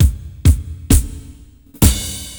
Ballad 100 Fill In Ending, Drums; HDR-Studio 2.1; Loops Demo (Drums)

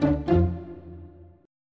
Step

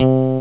Elec Bass (Pick) C2; 3D Ultra Pinball Demo

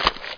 step mud; SpecOps2

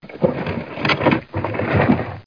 scrape; Redneck

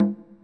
LOW CONGA

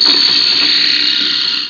zap; smonkey

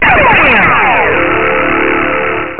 Startup Sound; Missions⁄Reliant!

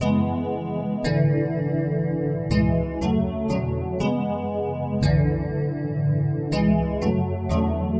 Keyboard

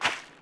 Grassy Step, Footsteps; urbanchaos

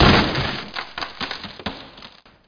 trees; Prangs

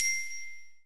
DING; Free Hearts; Gambling

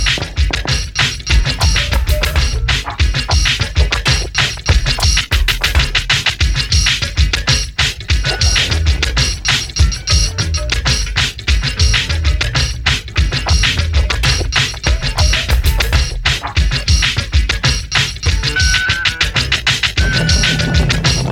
ring my bell

